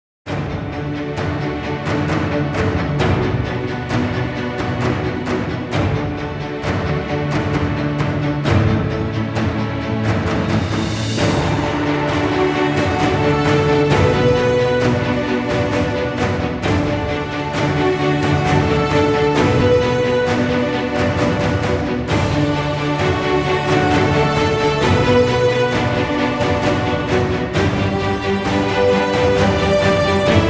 Epic Heroic

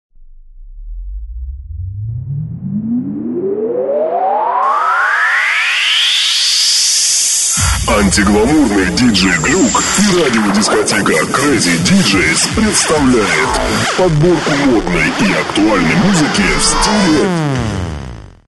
Hardcore, UK & Happy Hardcore

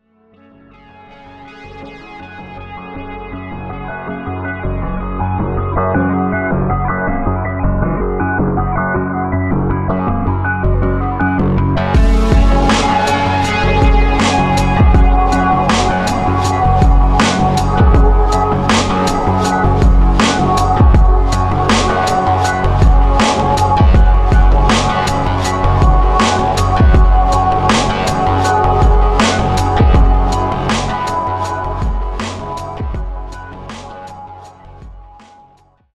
Loop; 5PM Loop

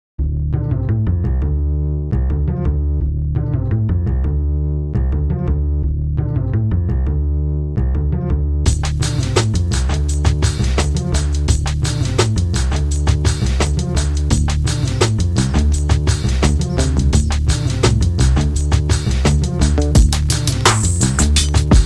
Lo-Fi Hip-Hop

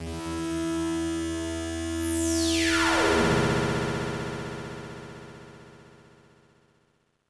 Synth Acid

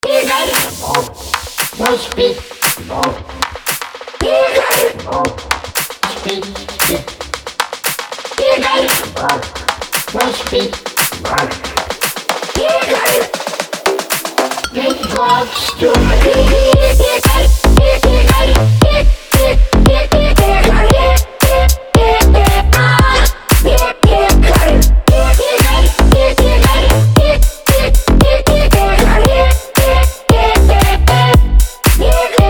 deep house fun remix; Уральские Пельмени - Игорь и Барсик

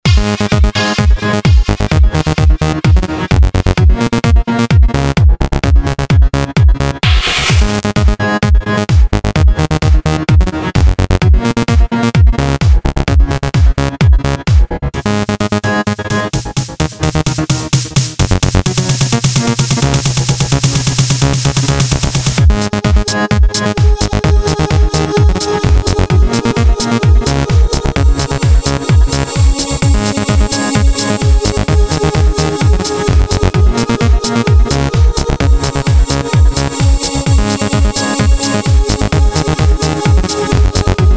Electro

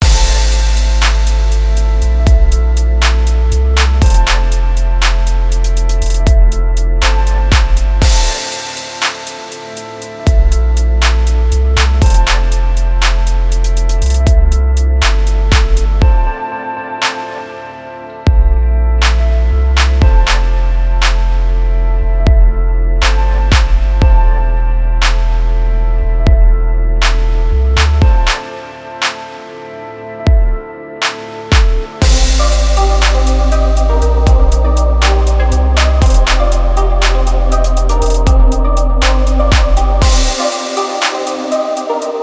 instrumental

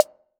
SNARE

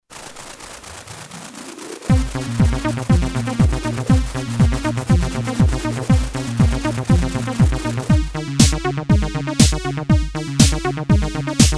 electro

